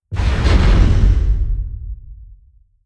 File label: distance dragon wing attack; Stellaris; guardians